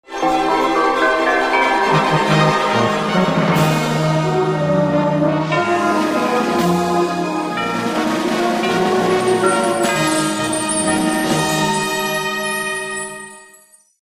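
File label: Amur waves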